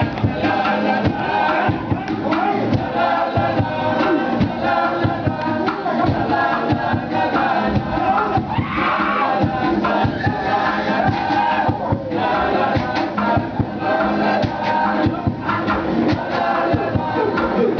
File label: music; savaii